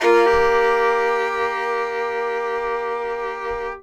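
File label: fiddle hammer D#4; Sampleheads; Whole Lotta Country 1 & 2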